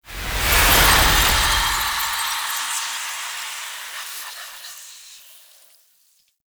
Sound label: Disappear; Kalymos